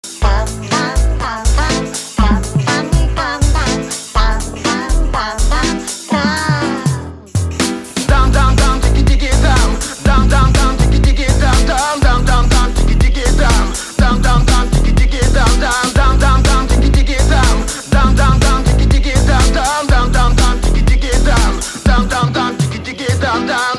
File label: dam dam dam tik tok